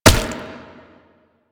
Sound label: Step; Warframe; Proto Infested Brachiolyst